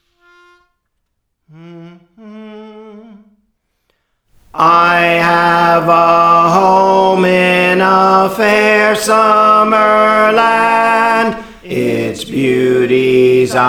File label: Bass, Praise For The Lord Training